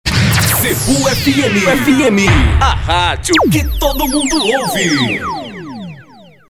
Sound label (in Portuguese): Vinheta; Zebu